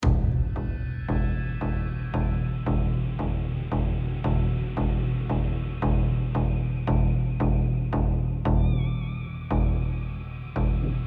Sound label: Tension; AC Tension 6 Sudden Death